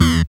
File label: SYN OLD, Dance Synth